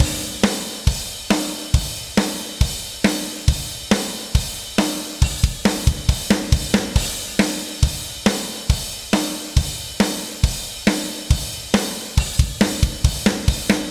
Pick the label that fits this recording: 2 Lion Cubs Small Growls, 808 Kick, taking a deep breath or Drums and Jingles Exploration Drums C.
Drums and Jingles Exploration Drums C